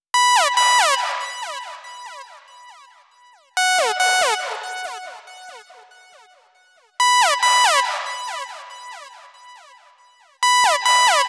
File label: Synth Saw Slide, 140 BPM; Vengeance Samples; Vocal-Hook Kits; Song Kit